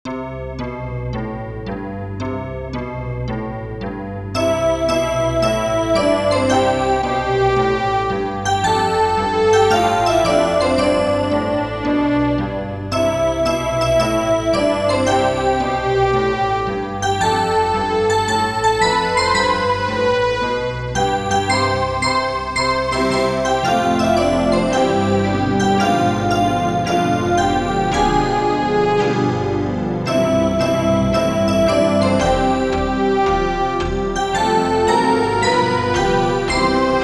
Synthesizer Version; The Complete After Dark

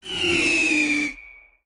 Meteor Scream; Lethal Company

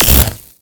MagicItem Sparx Shoot

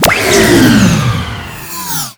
UI MagicItem ChargeDown

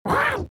Shakey Kid Roar; The Binding of Isaac

